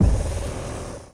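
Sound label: driller drill drilling kit tool; nova sound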